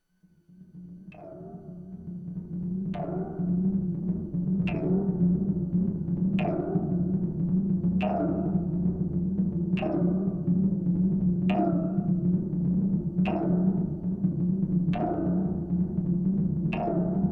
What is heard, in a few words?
little noise